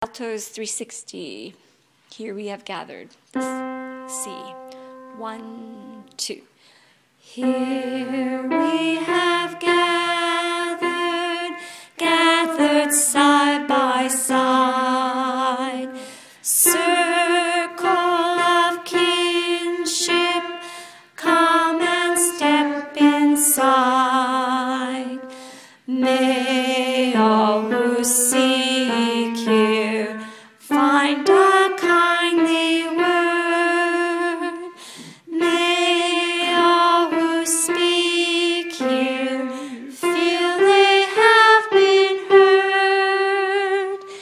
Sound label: Alto